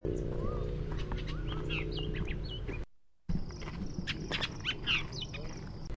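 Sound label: Bullock's Oriole song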